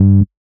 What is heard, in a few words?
Syn Bass; Equipped Music